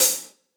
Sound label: Acousti Hat Hit, hihat